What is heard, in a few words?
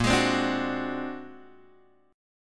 A sharp Augmented 9th A sharp Guitar Open G